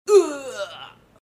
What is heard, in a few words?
Sfx Archer Dead; Mighty Knight 2